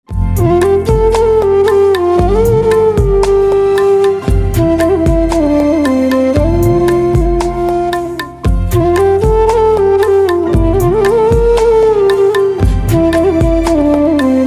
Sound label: Flute, instrumental ringtones